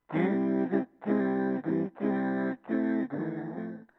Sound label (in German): yamaha tyros 5 vocal harmony grammophon